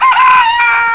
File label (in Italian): GALLO